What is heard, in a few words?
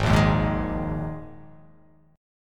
G sharp Suspended 2nd, G sharp, down